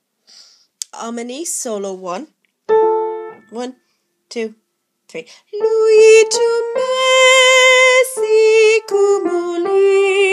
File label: Solo